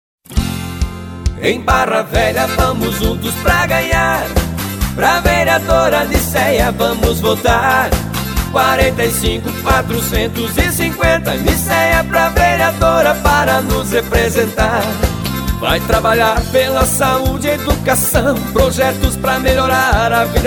jingle vereadora

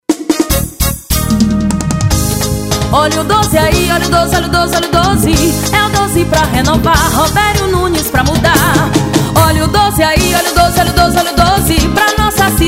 jingle; nosso studio

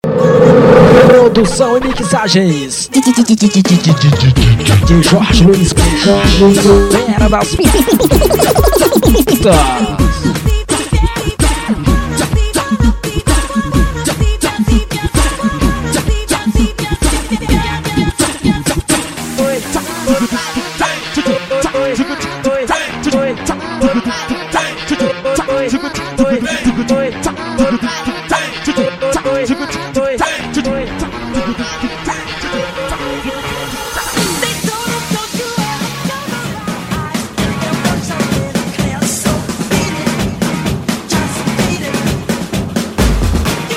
funk

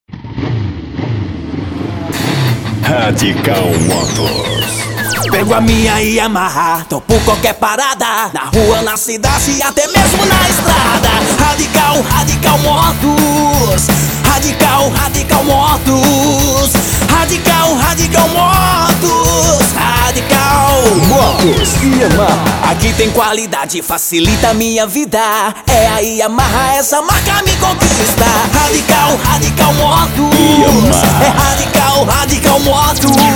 jingle radical motos

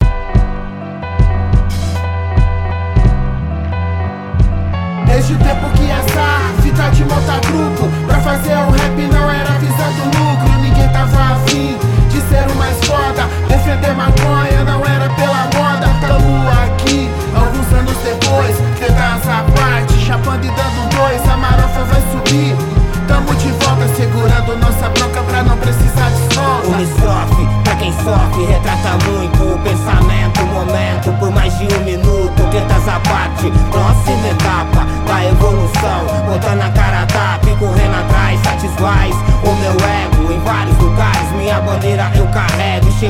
introducao contrabaixo

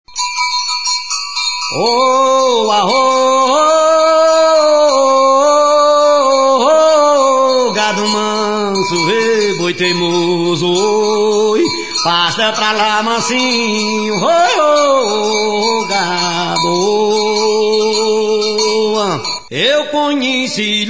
aboiador toada aboio

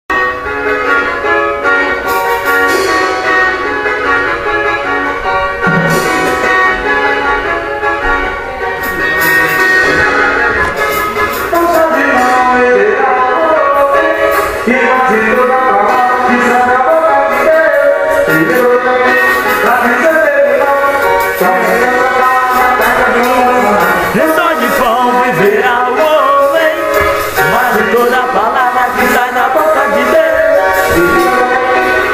ensaio

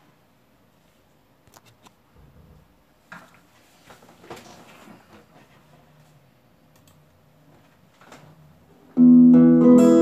toada

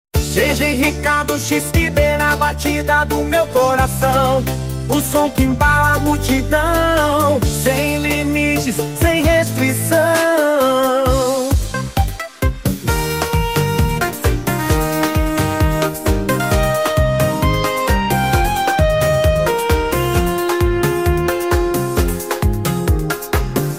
no comando dj sertanejo

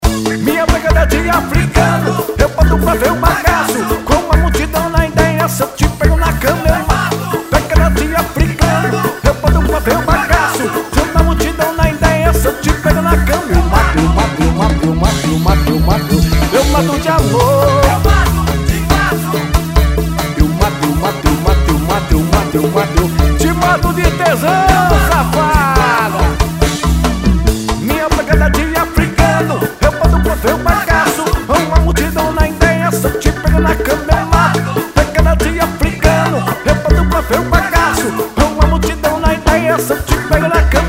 samba ensaio; Planeta You Samba; ensaio de verão 2012

